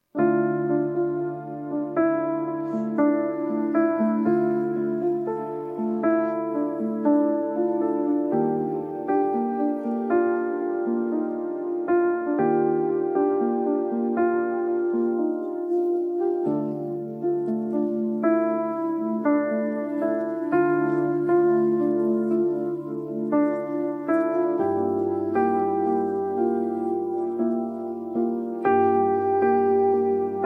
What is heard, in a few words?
piano Version